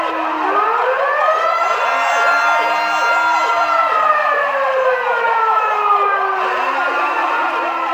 Alarm Trigger Loop; Scaldra; Warframe; NineteenNinetyNine; Stage3RideOrDie

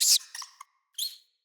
Deco Rat Idle B Variant Two A; Ambient; Vocals; Verminia

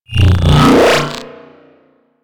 Mine Osprey Reload

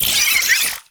Oraxia Brood Spider Vocal; Warframe

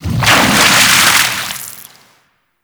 Forest Large Piston Water Splash; Props; Warframe; Grineer Forest

